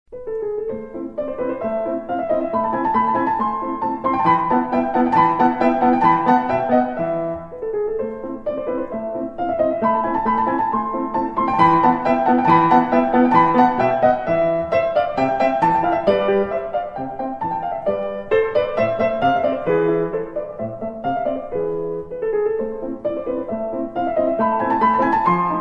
Piano Long Ringtone

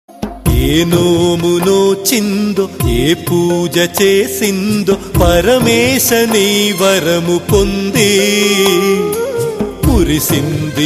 Telugu Ringtone; Yogi

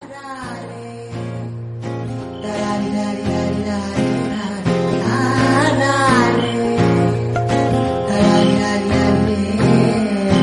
Instrumental BGM, Telugu; 30 Weds 21

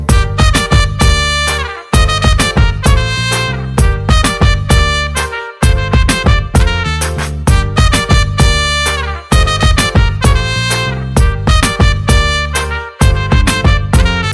Saxophone Tone, Telugu

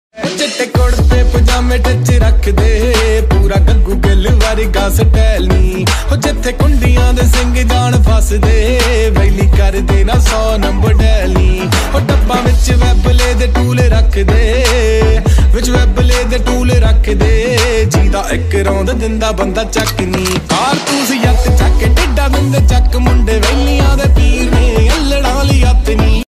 Punjabi Tune Ringtone

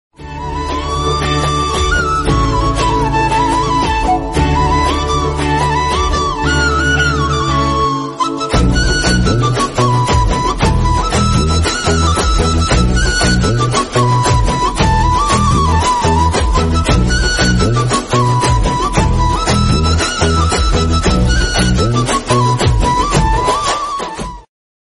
Telugu Romantic Song BGM

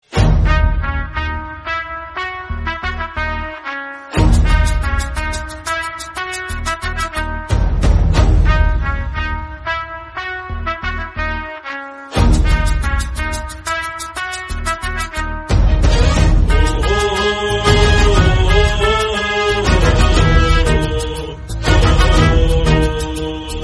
BGM, Tamil; Draupadi

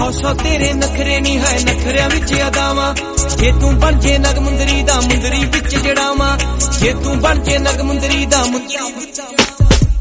Punjabi Ringtone

